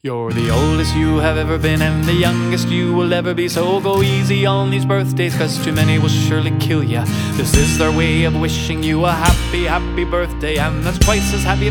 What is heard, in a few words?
Twice As Happy Birthday Song